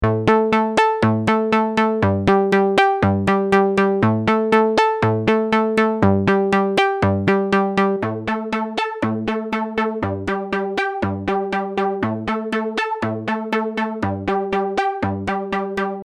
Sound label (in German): Synth Chorus 50 Prozent